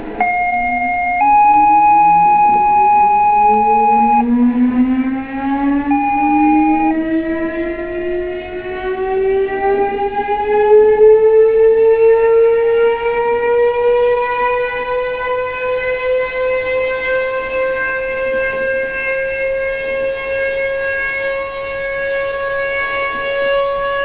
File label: sirens; pottsville humane